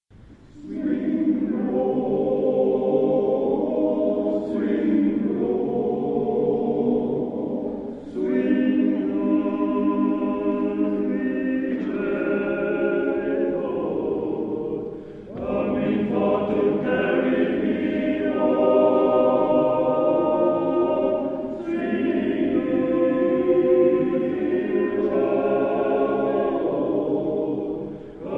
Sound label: Swing low